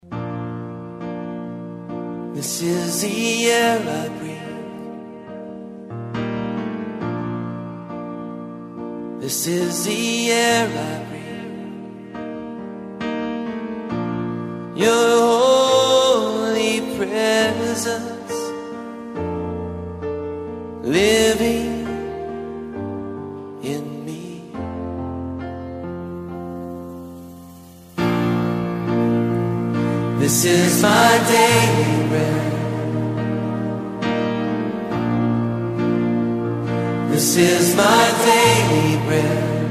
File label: Breathe; Worship Flow